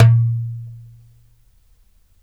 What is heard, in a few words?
DARABKA, Mediteranean Traditions, PERCS